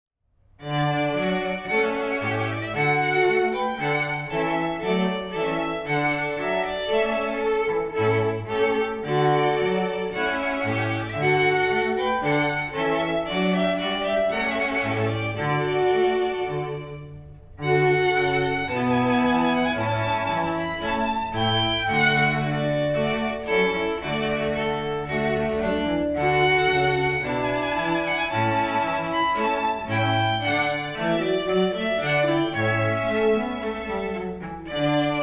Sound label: Trumpet Voluntary in D Major